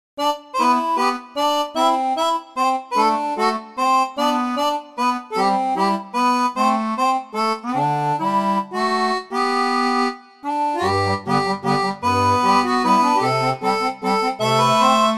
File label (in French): seraphone, orgue barbarie